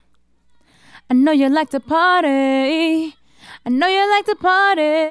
Female Solo, Hip-Hop and RnB Vocals

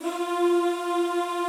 F3-L, Classical Choir; Best Service; Extended Classical Choir

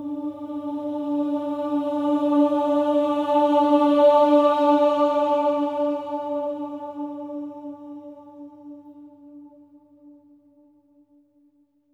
OH D4, SWELL OHS, Voices; Spectrasonics; Symphony of Voices

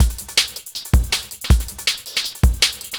Beat, Futurist Drum & Bass; Drums & Percussion

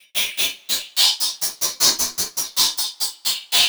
NRG SFX, Trance

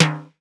Lo Timbal, Percussion; MARKYDRUMS

